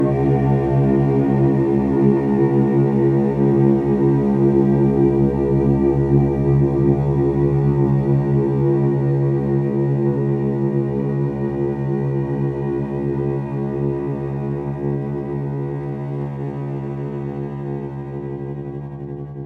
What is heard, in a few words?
Cello B